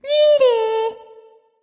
tongue; smite; voicepack; ratatoskr

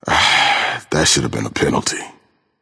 death, voicepack; smite; vamana

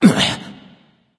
grunt hit, voicepack; smite; vamana

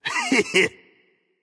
heh heh; Smite; bacchus

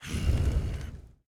grunt; fenrir

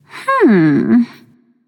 thinking, voicepack; smite; pele

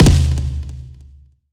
drum; smite; god raijin

